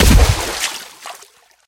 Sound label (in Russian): bolshaya volna hlinula na bereg